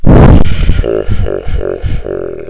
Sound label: evil laugh